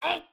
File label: hurt